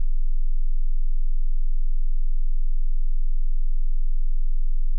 20Hz tone

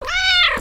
meow cat

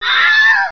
scream wilhelm

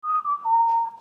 whistle three

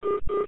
hold tone

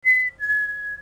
whistle two

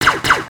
blaster

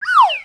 slide down

